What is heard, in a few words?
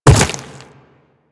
Quadruped Medium Land Dirt, footsteps; Warframe; Infested